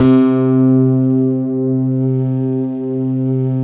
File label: SYN JD8000, Roland